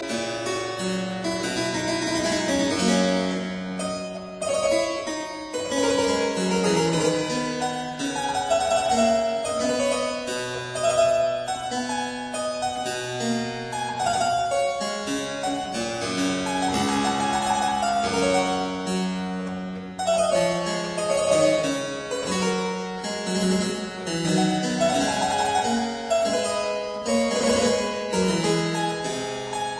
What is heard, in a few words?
Suite in C major Saraband; Locke; Melothesia; Arthur Haas